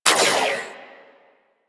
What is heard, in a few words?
Shot, Weapons; Warframe; Tenno; Miter